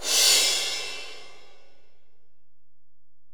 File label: Ride, Alesis DM-PRO, Drum Machines